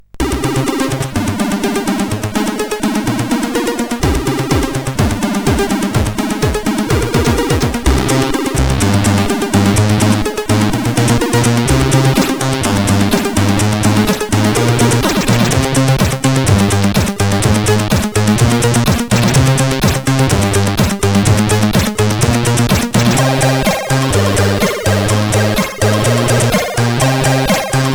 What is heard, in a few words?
sid CSG8580